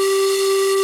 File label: PANPIPE G4